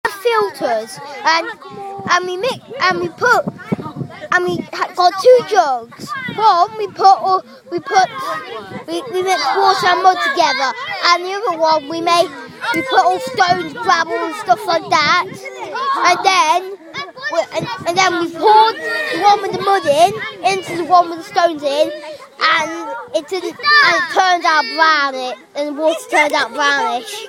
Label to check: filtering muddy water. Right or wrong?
Right